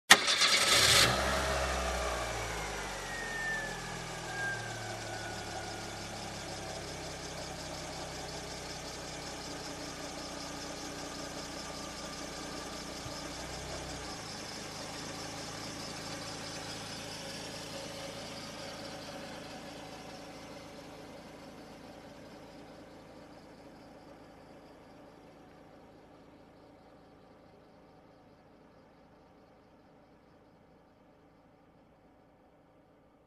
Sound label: Car Engine Ignition and slowly driving away